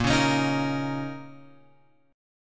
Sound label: A sharp Minor Double Flat 5th; A#mbb5; {6 4 X 6 4 6} strummed